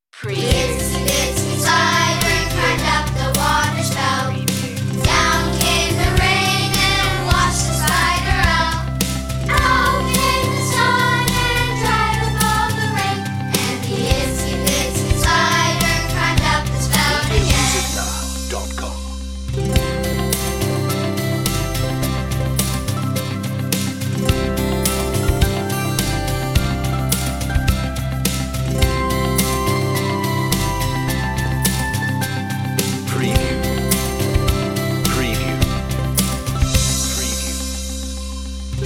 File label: itsy bitsy spider children group vocal no sound fx